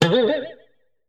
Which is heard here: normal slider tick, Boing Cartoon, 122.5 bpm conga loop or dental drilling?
Boing Cartoon